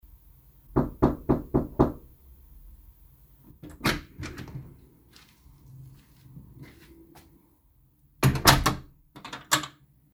spokojnyj vo vhodnuju plotnuju s otkryvaniem

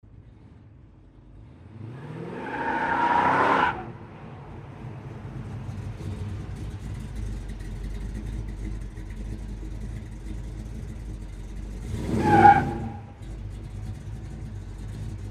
mashina rezko nachinaet dvizhenie s vizgom shin

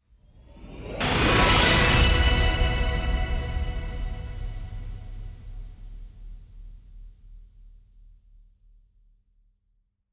pravilno akcent

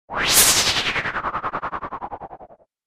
S drozhaniem

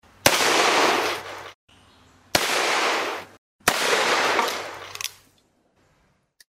vystrely kolt 45 raznye celi

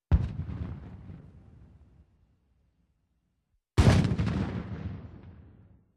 Tank M60 vystrely; Танк М60 выстрелы; Звук танка в движении, заводка, старт, остановка внутри и снаружи. Выстрелы танка

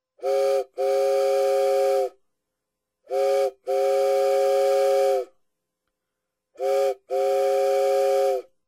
gudok parohoda

